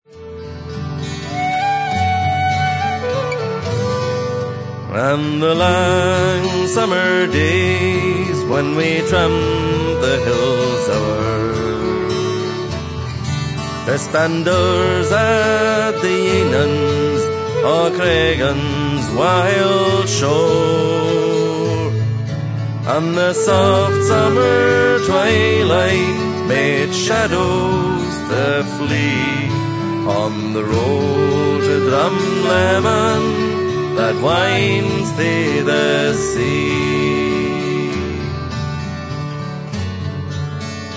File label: Drum